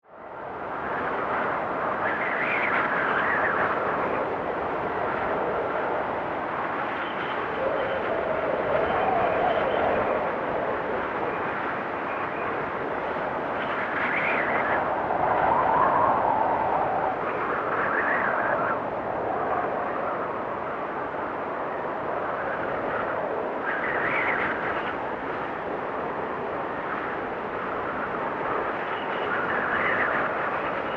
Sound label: Wind Storm Cold, Weather; BlastWave